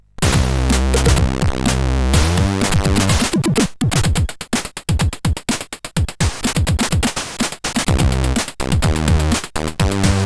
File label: Music, CSG8580R5; sid